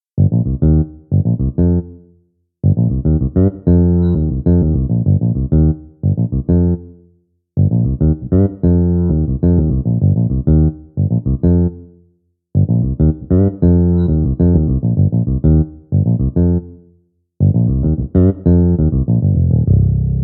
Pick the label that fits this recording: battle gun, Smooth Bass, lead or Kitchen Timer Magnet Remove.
Smooth Bass